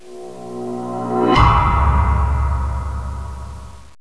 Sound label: mission end Round